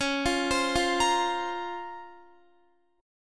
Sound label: Db Major 13th, Guitar; arpeggiated; JGuitar